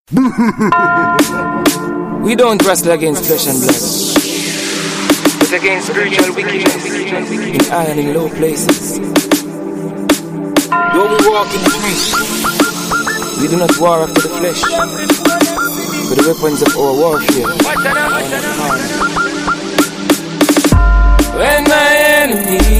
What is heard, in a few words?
Riddim